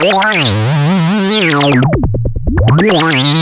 ACID LINE; AKAI S6000 CD-ROM - Volume 1

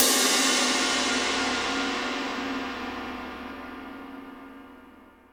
Crash, impact samples

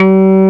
C4 ORG; East West - Global Sounds

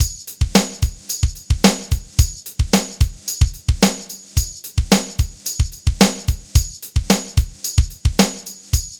ROCK; INIS; Funky Xtreams

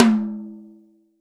TOM, Real Drum Kit, Rock Kit

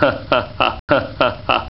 Vox FX

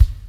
LOW AC KIC, SUPER FUNK; Pro-Rec - Synthsonic

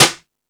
Jazz Drums, E-MU Pro-Cussion; drum machine

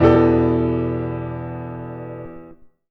Piano Chord

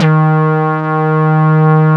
PL MOOG; Wizoo - Powered Nord; STEREO LAYER

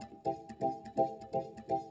E PIANO 125, Keys; Houseworx

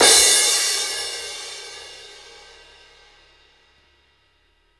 CRASH, Drumworks; Sampleheads - New York City Drumworks VOL-1; SW KITS

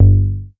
G2, BASS; Q Up Arts; Dream Experience; BASS BANK 6

